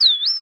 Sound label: Animal Bird Brown Headed Cowbird Chirp; animals; AdobeCC